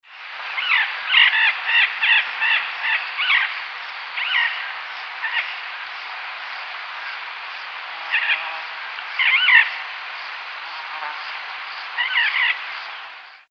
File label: Primolius maracana; Arroyo Blanco Las Ninas